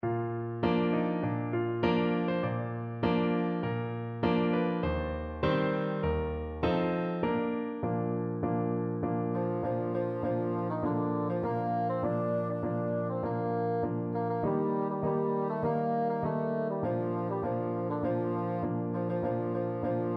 bassoon

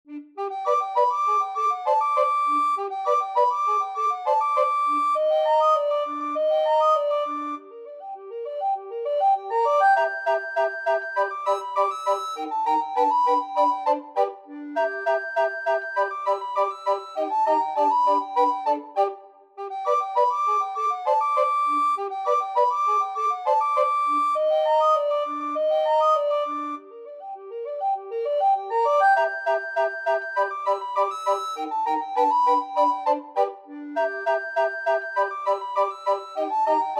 recorder quartet

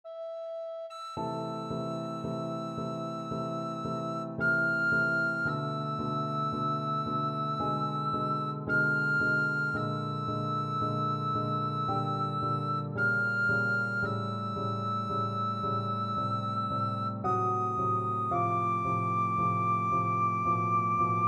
recorder

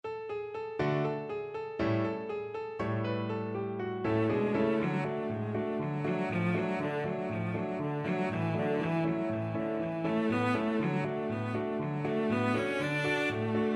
cello

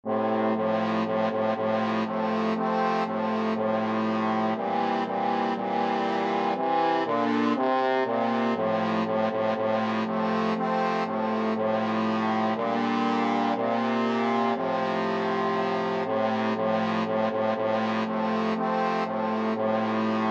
trombone quartet

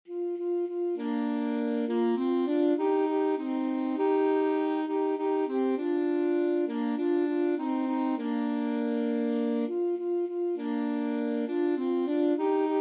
how great thou art alto saxophone duet

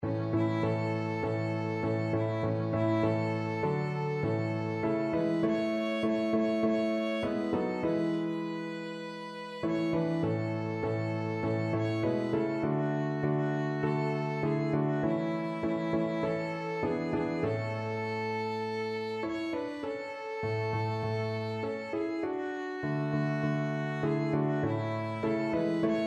washed in the blood violin

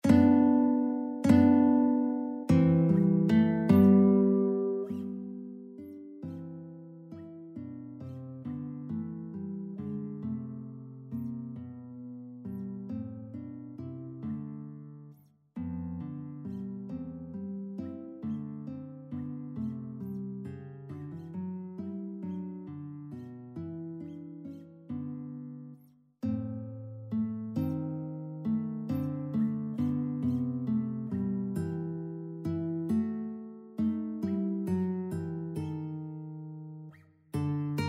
guitar

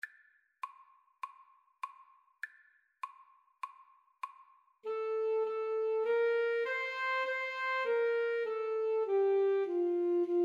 joyful joyful 2 A SAX

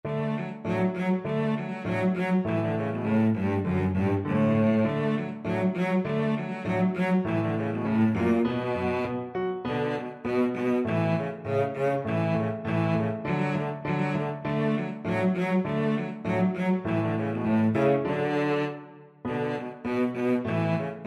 Turkish March, cello; Beethoven; Ruins Of Athens Op113

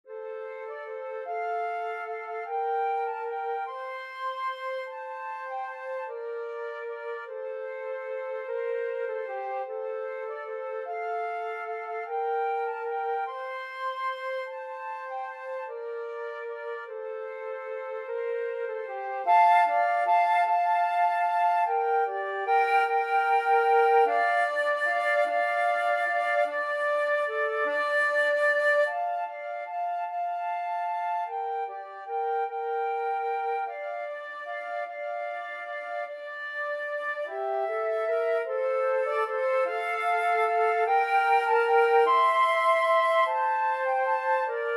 skyeboat, flute duet

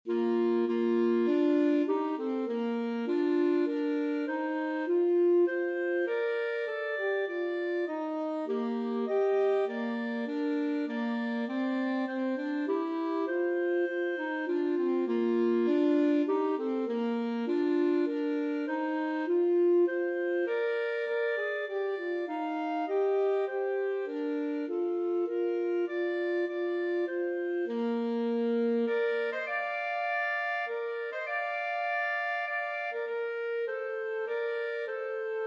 god glory, clarinet saxophone duet

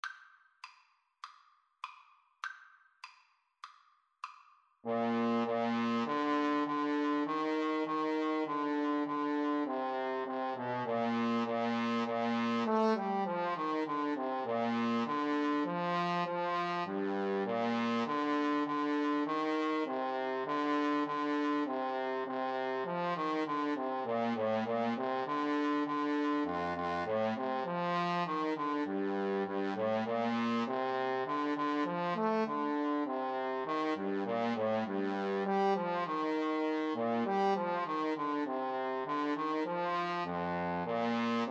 Trombone Duet

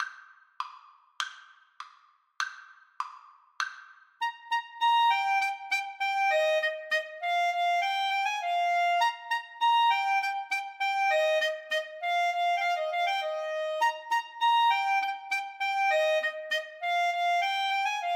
allegro, Clarinet Duet; duos

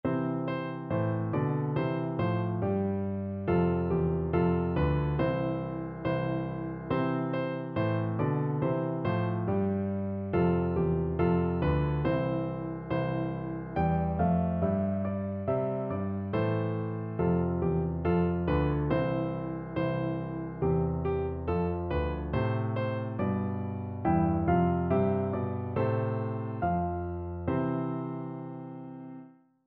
piano, piano duet

